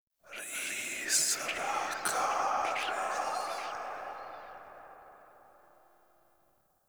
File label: Room Whisper; Warframe; Entrati; Dead End Secret; Dante Unbound